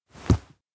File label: bump body; awayuki; anemoi